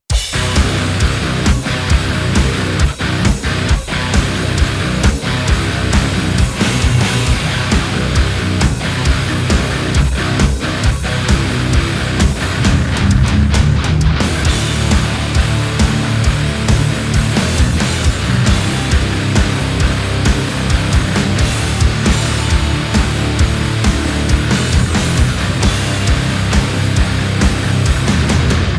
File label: higain cabs